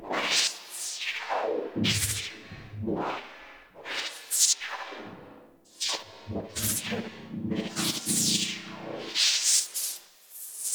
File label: Electricity Pit Loop; Warframe; Ambience; Entrati; Dead End One